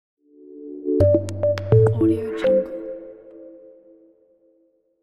short elegant logo

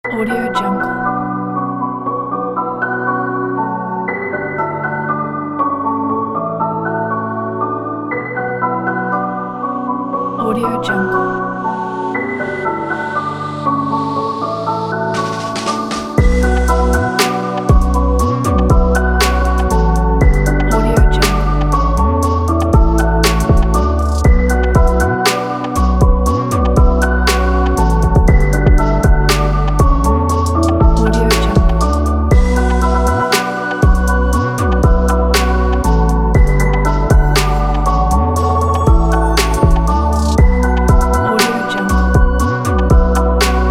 organic flow kit

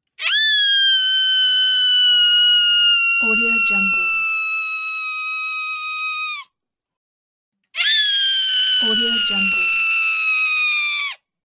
girl screaming

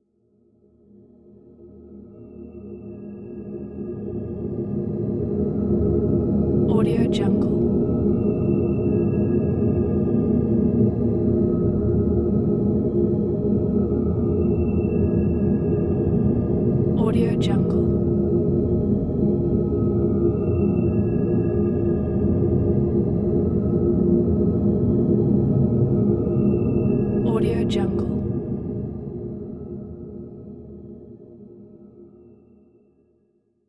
outer space soundscape

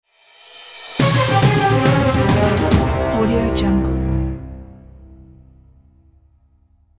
quiz show next level logo

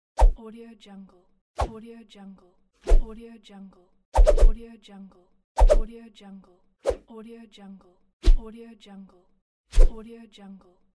swish pack

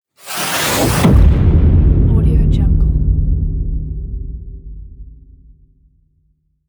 trailer punch

